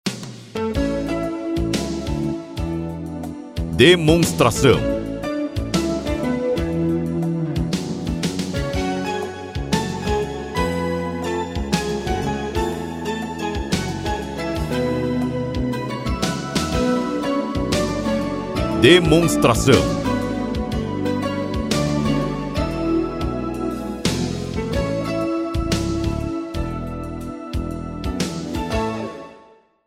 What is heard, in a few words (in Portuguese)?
Trilha